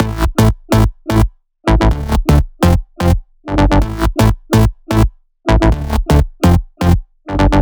126BPM Melody; Vengeance Samples; Purple Kit

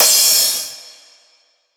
Crash, Cymbals; Vengeance Samples

